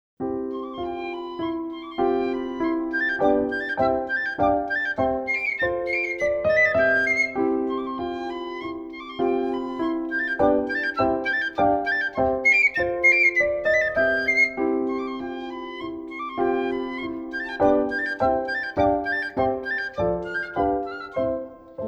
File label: piccolo espagnol; Kendor Music Inc